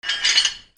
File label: FRICTION